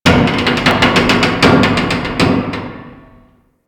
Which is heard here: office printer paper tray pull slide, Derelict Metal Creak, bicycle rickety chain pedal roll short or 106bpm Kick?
Derelict Metal Creak